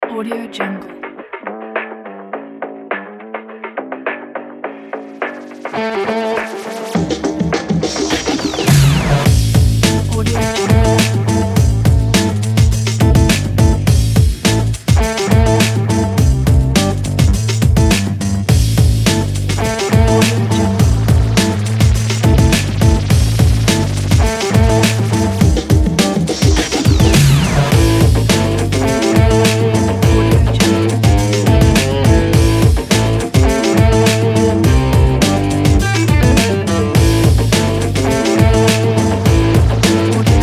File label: cool rock beat sports kit